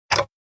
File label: tick